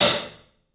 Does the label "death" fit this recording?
No